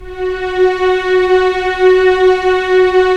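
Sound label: STR String Section; Roland - LA Composer vol. 4; Full Sec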